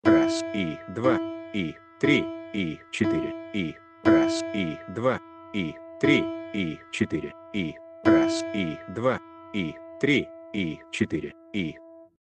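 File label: count of beats four quarters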